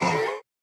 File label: Damage